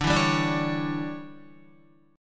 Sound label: D Major 7th Suspended 2nd Suspended 4th D down Guitar 4F 4A 5C 5G 5C 6E; DM7sus2sus4; strummed; JGuitar